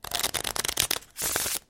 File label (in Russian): karti peretasovka kolodi poker igralnie karti blekdzhek azartnie igri